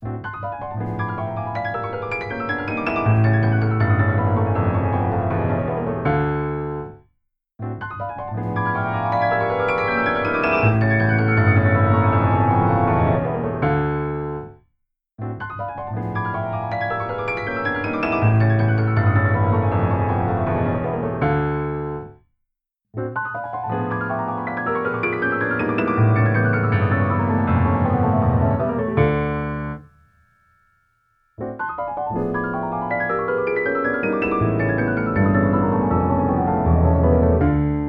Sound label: Resonance Pedal